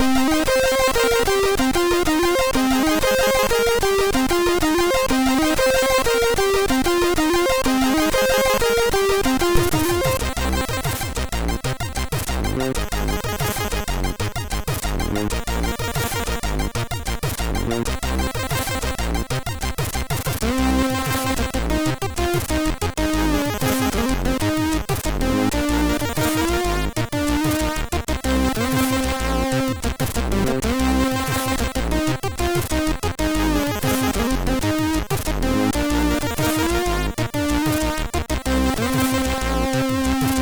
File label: A Melody, MOS6581R3; A Melody for DT